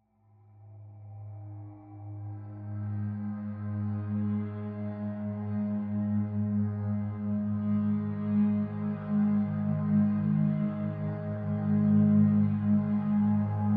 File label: Ambient Meditation Music With Sound FX